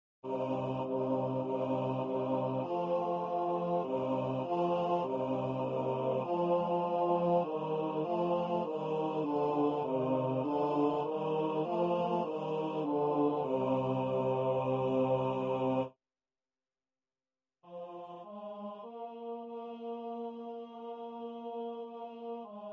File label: tracto bajo